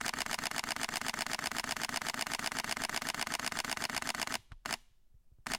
Sony a7 III HI Burst

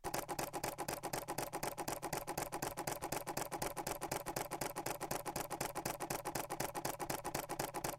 Canon EOS 7D Mark II Silent Burst

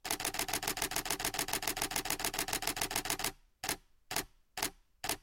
Canon EOS 80D Burst

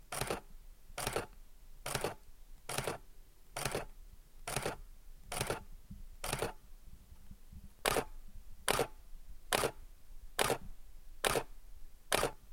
Canon EOS 6D Silent

